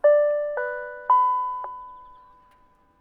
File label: Announcement (3-tones)- Ping,dong,ding